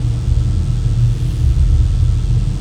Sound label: mohole beam loop